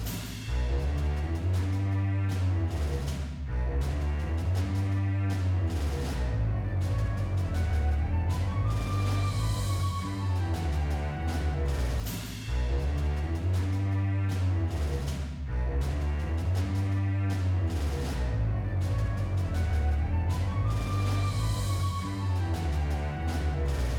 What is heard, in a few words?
Dreadwood Boss C 160 Low